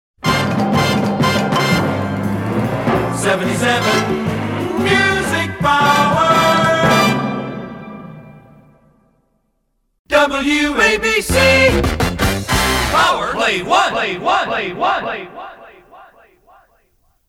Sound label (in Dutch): Amerikaanse jingles; Music Power; WABC